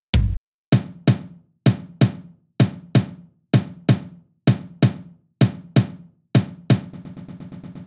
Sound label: Drum Loop 128BPM Tom; VEC5 Drumloops; VEC5 Multi Loops 128BPM; Loop Elements